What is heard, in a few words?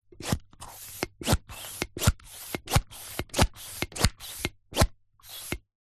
household pump hand small plastic pumping lever perspective; zapsplat